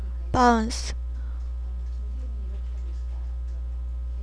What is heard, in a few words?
bounce